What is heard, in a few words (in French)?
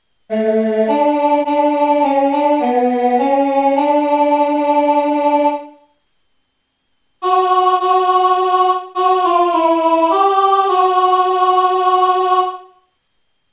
alto; Chanson traditionnelle des Appalaches